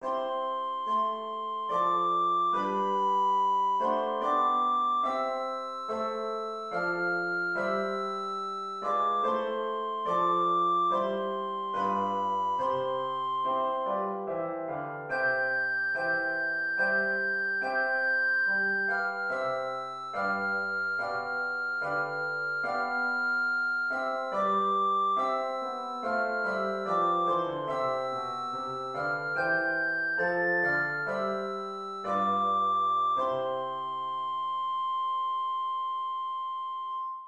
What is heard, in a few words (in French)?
hymne national anglais